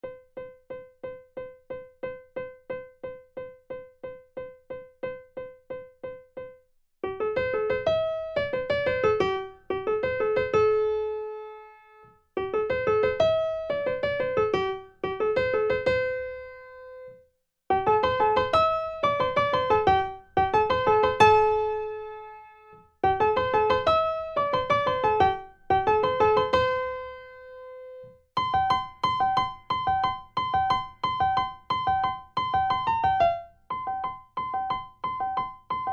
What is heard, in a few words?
Sailboat 180 Primo